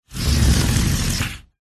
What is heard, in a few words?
household sliding window slide open or close; zapsplat